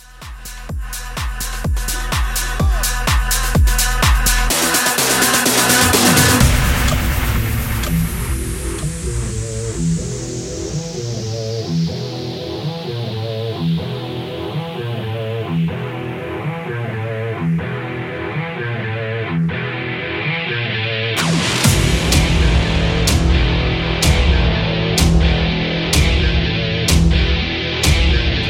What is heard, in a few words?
Nasty Festival 126